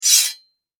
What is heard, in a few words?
Household Knife Holder Insert Scrape Blade Metal; Kitchen